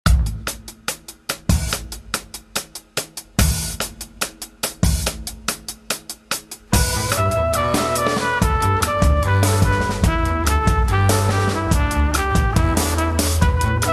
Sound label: Five Tone Scale